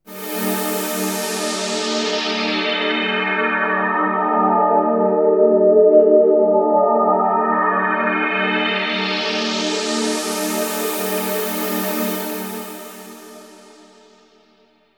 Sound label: synth pad ambient 160 C; Sphere